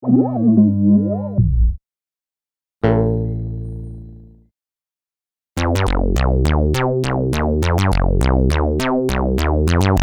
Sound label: Moog Modular